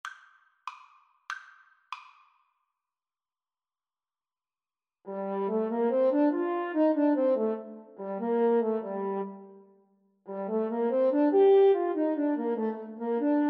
French Horn Duet; Elgar Serenade

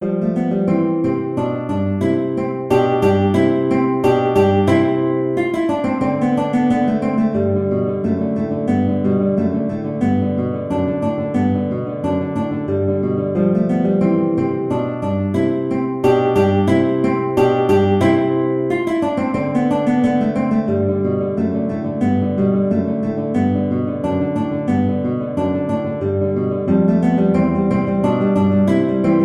Vivace, guitar; Giuliani

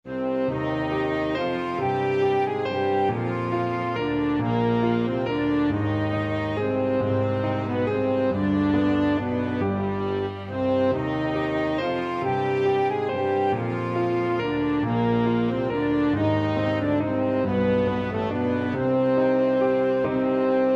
flexible unison

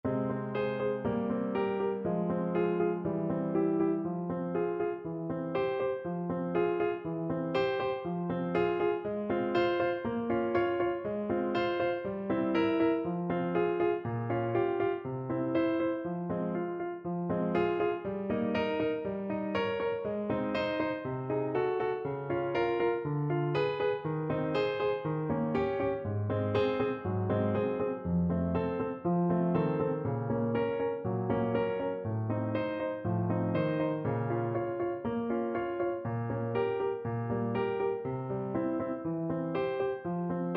Euphonium

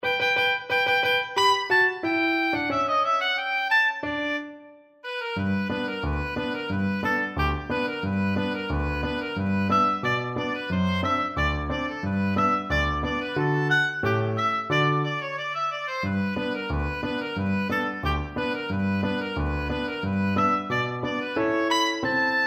radetsky march, oboe

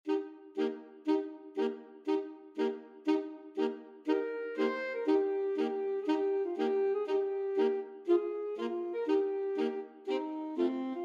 alto sax quartet

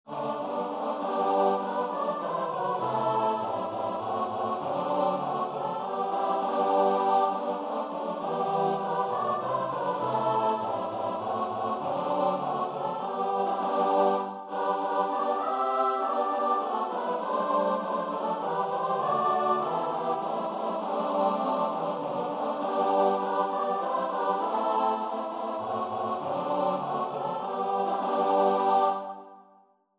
preab san ol, choir

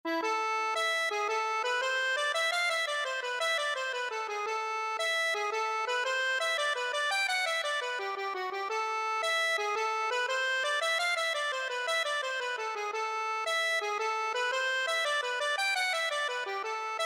crabs, accordion

